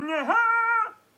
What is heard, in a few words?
Nee Aaaahhh